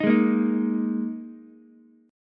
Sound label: Ab Suspended 2nd chord, Ukulele; strummed; 8,8,6,6; JGuitar